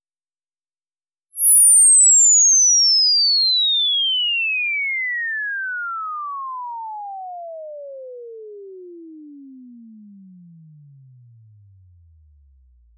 Inverse Sweep 20 to 20000; ESS

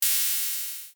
circular output, virtual membranes

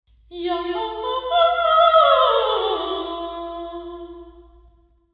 alcuin sing; alcuin college university york

